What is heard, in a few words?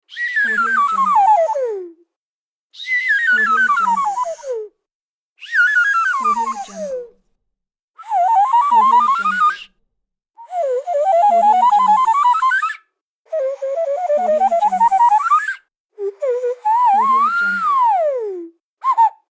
whistle bouncing rise and fall